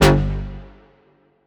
Synth Shot G

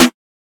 Snare, Hip Hop; Drum One Shots; Rico

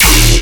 Bass Hit D#, Dubstep

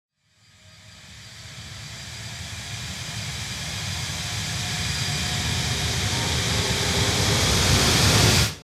Riser

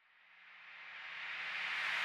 Drum Loop 85 BPM Reverse; Loop Stems; Cymatics; DOPE DRUMS VOL. 1; Find Drum Loop

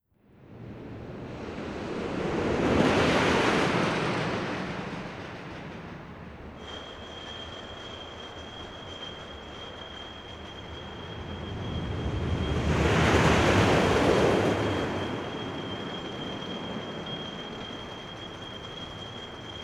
Roller Coaster Bys; Sports & Recreation

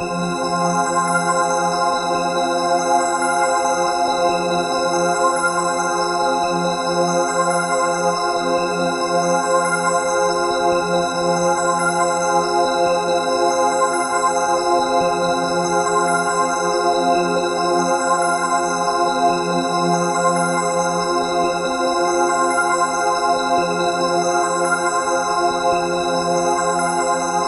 Pad F (140); Trap and Dubstep; Horizontal

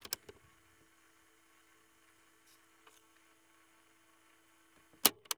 Gear Foley Cassette Deck Fast Forward; FX; Cymatics; Eternity Sample Pack

